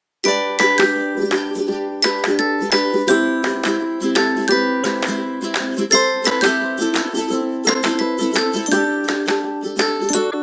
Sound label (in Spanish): Música de Fondo Alegre y Divertida Música instrumental Alegre